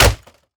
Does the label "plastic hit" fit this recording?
Yes